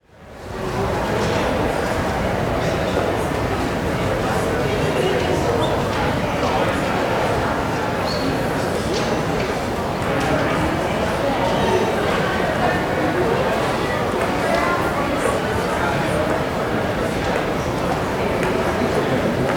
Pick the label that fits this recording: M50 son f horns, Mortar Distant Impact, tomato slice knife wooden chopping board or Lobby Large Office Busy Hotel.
Lobby Large Office Busy Hotel